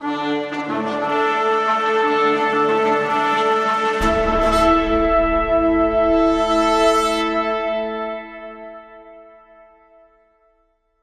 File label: Fanfare